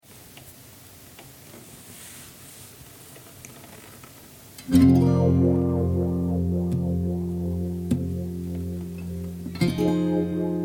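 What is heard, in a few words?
F 100 guitar chords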